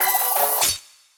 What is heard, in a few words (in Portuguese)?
Ataque teleguiado